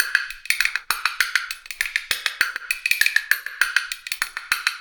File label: Tactile Impulse, Processed Drumkits